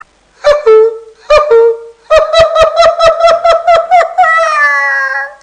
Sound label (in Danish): sjove grin; joker